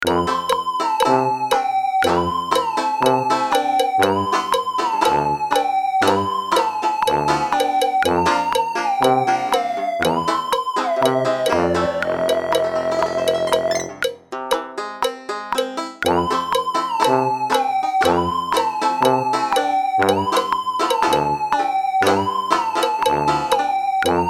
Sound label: earth music